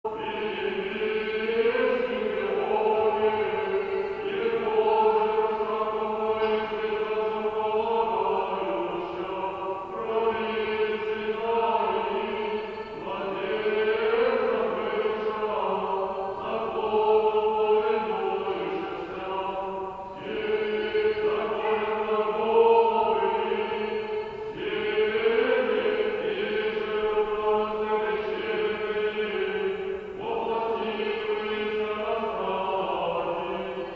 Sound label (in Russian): Рогожский Хор; Стихера на Господи возвах Сретению; Приими Симеоне